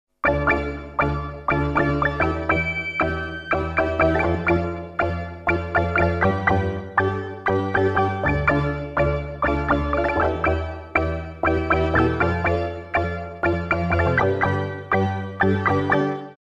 Korg Trident Stakkatto Flanger

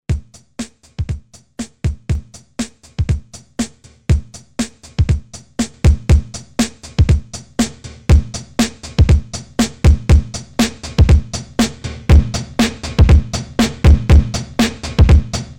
Distortion

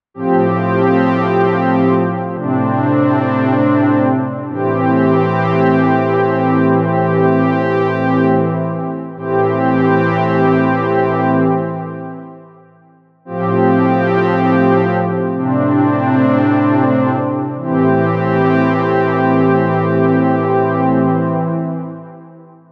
Warm Pad 4 x Oversampling; Cherry Audio Mercury-4

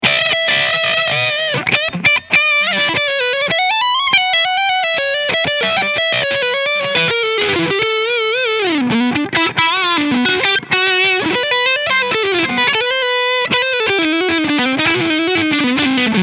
PRS NF 53 Overdrive Neck Pickup; Blue Matteo

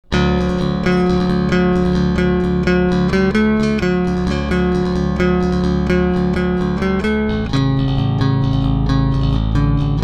150bpm Pick 4 EQs

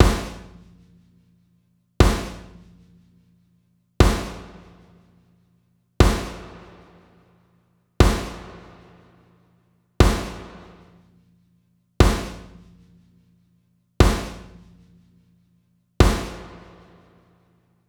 Snare Reverb; EAE GigMix 4-1

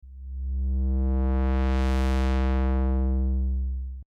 Arturia Filter; Brainworx

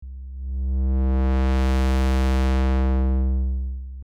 Brainworx BX Filter

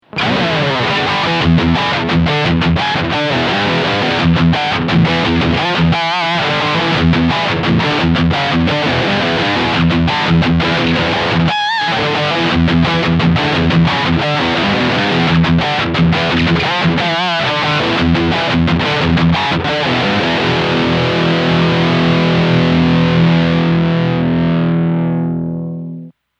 Two Notes ReVolt Guitar Preamp High Gain

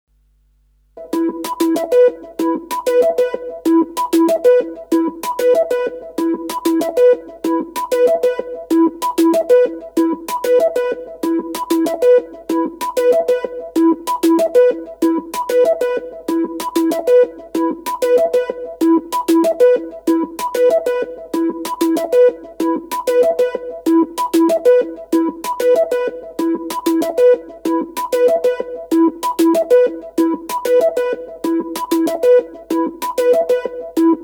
Noise Grid Arp

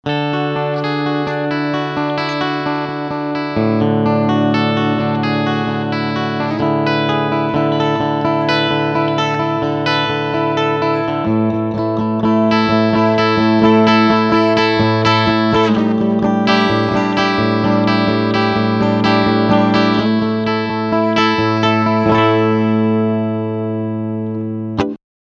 Bridge pickup Clean

